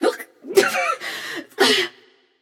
clear throat passion; chaac

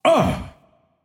Surprised; smite; kukulkan

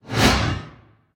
basic swing; smite; thanatos

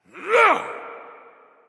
grunt; Smite; bacchus

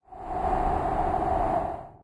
wind; smite; kukulkan

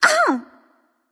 ouch light; smite; voicepack; hel

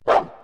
bark; Smite; Cerberus

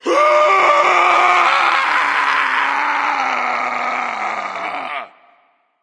battle cry; smite; voicepack

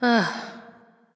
nv sigh; smite; awilix

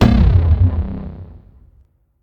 fire; smite; raijin

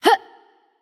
grunt jump light; smite; voicepack; hel

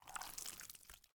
kraken slither; smite; poseidon

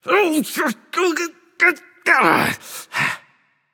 Grumble; smite; apollo

